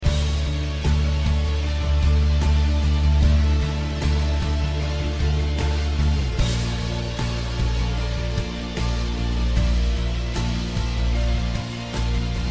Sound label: heartbeat music